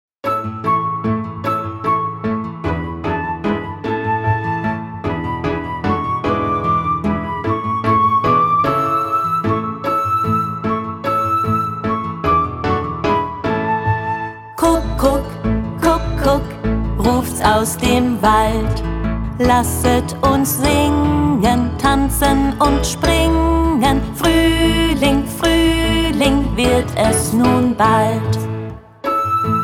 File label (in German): Kuckuck kuckuck